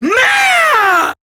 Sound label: death, female